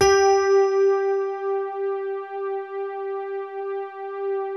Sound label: BALLAD G3, E-PIANO; Music Store - Claudius Diemeter Schwerlos